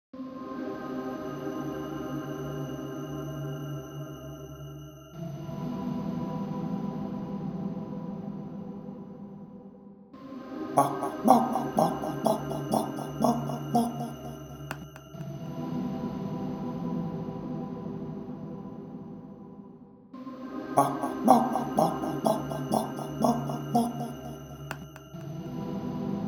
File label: dark ambient